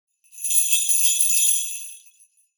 sleigh bells

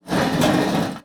table concrete drag

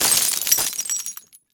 glass panle crash

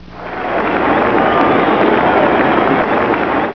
CROWD NOI

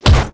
heavy punch

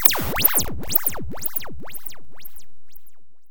SYNTH

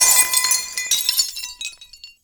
glass shards kick

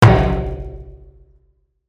dumpster hit

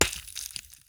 stone drop

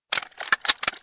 Submachinegun Reload

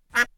duck

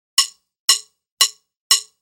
drum sticks

